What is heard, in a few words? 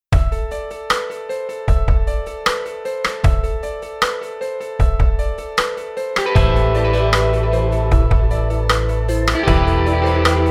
Pop Rock